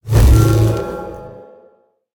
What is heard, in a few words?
dash; Smite; god Khepri